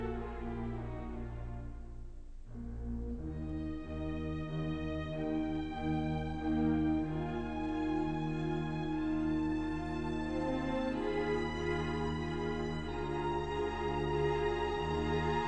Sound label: classical